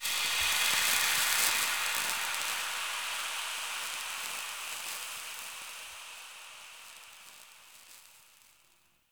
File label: Mask Smoke Close; Cinematics; Intro Throne Room; Deacon